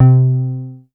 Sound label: MOOG BASS C4; Best Service Dream Experience